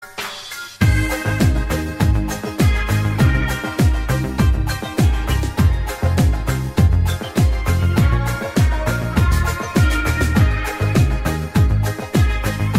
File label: sunshine reggaeton laid back